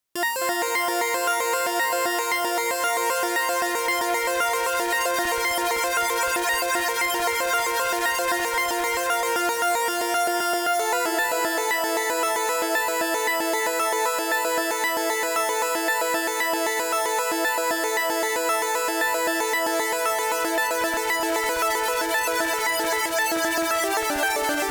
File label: drone tripping